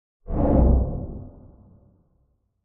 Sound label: Space Ship Cloak Off